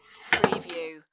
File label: Pinball sound, ball slides of rail and falls to floor